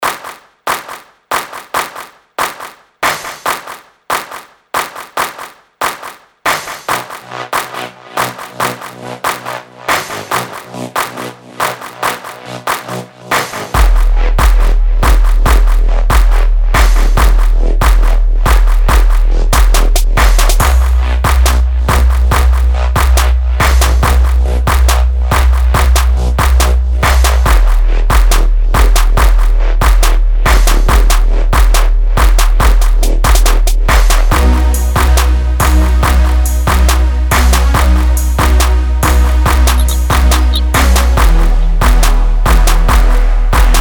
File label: Instrumental